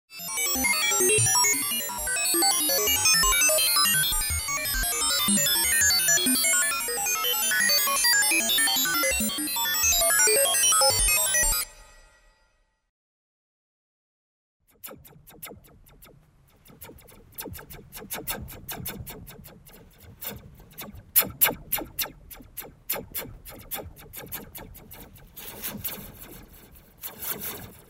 kompjuter na korable

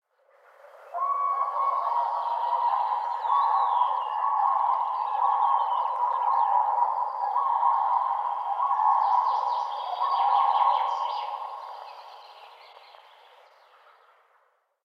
dalekij golos pary zhuravlej

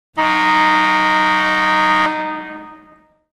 zvuk gorna na hokkee

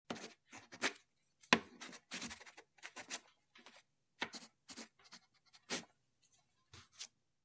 pismo karandashom

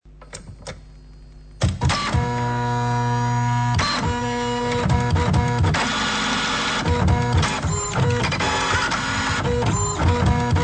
zamiksovannyj zvuk printera

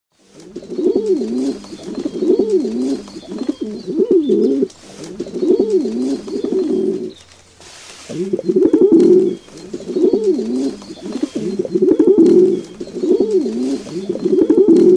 golub vorkuet pered golubkoj

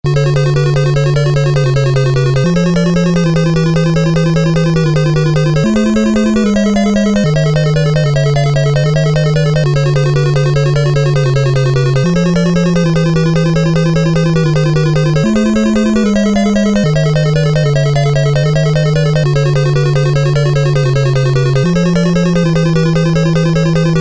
trevozhnaja muzyka v zamke; iz mario

